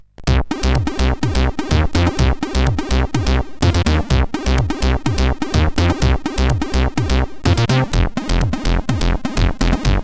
Intro, sid CSG8580R5; Brainbombs Intro

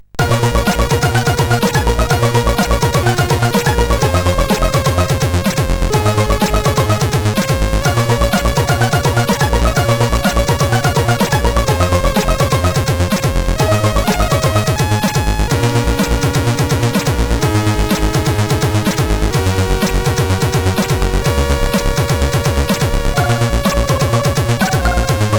CSG8580R5

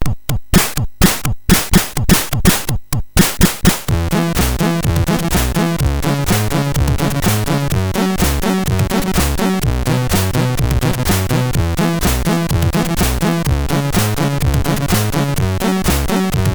Dance, sid MOS6581R4; Signor

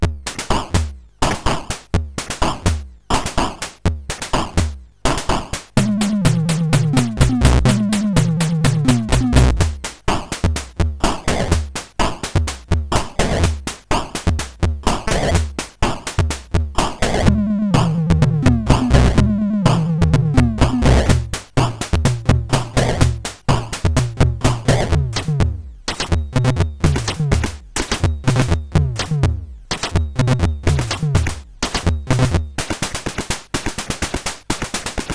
Drum, sid CSG8580R5

